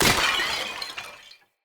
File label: Vase Clay Medium Break; Vases